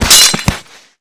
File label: Body Falls Heavy Glas